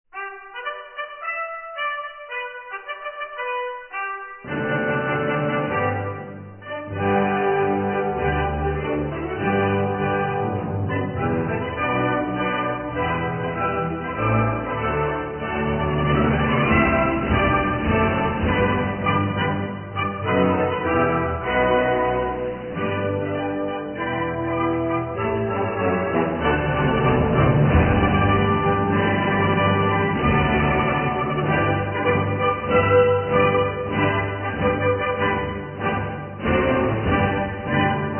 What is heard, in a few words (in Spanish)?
Himno Nacional de China